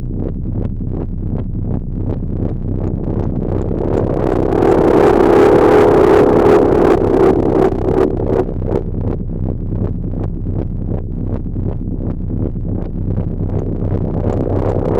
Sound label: FEEDBACK, FEEDBACK LOOP; Zero-G Ambient 1